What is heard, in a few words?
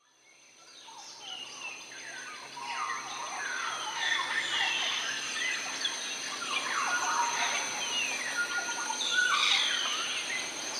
The Bai Birds